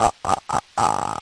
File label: lofi sega sample; LO FI and 8 BIT KITS; Lofi Sega Samples